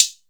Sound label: sharp hi hat sound a key